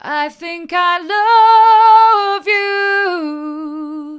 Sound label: hard, vocal